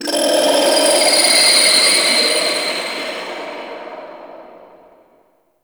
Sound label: Clicky Texture; Ambience; Warframe; Entrati